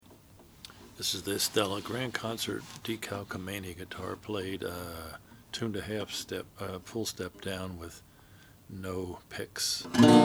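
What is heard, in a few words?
oscar schmidt stella decalcomania, Guitars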